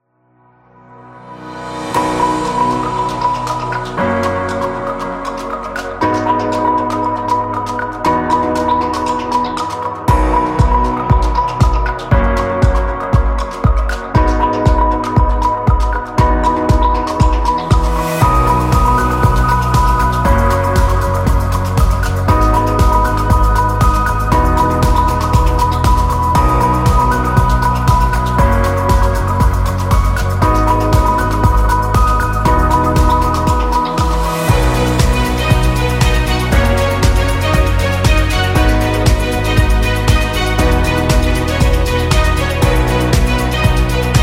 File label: Corporate Uplifting; conference